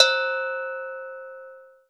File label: sfx perc